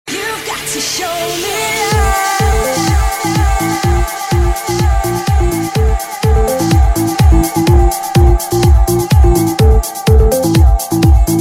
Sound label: Ibiza Music